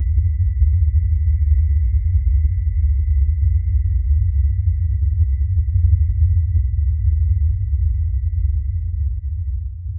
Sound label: alien pulse with thick; throb